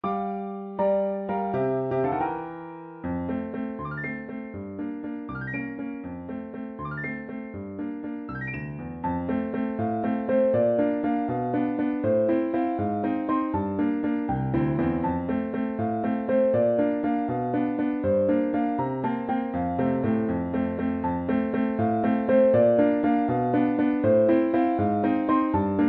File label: Underwater Theme; supernintendo; Super Mario World